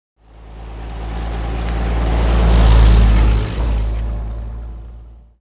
Automobile; By; Buick Up And Past At 20 Mph, antiques; 1928